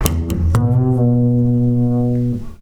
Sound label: Double Bass F; Total Drum&Bass